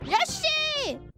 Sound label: Scream; Yoshi; IRyScream